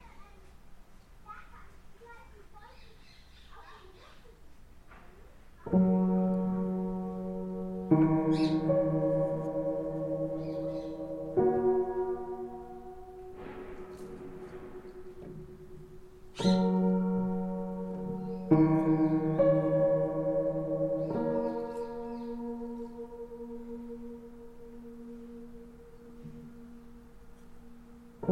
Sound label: piano child